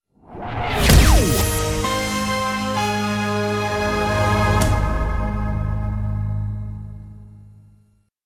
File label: NBC CHIME